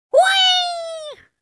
message notification sound whatsapp notification notification ringtone